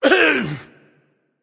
grunt hit; smite; kumbhakarna

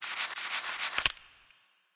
lobby victory; smite; zhongkui

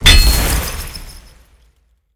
aoe stun; smite; tiamat

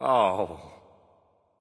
grunt ugh; bacchus

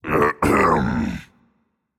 clear throat, voicepack; smite; jorm

